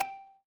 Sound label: feedback merge